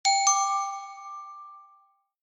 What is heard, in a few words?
Notification